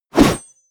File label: blade whoosh medium